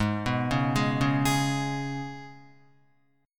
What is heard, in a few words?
G sharp Minor Double Flat 5th G sharp, Guitar